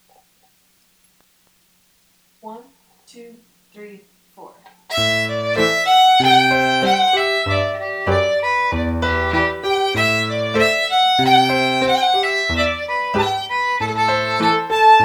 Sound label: Fiddle and Piano Medium; Muilean Dubh